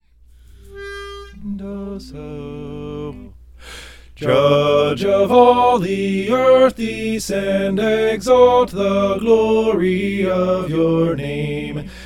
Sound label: soprano